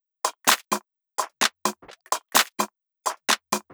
Loop, 128 BPM

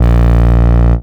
Bass Shot A#; Mutekki Media; Vengeance Electroshock Vol.2; Bass Shots

